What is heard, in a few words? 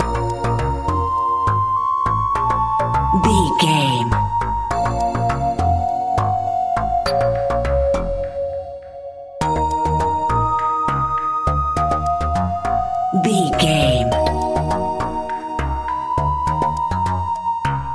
mysterious task video game